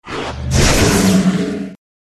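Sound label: Two headed Dog attack bottom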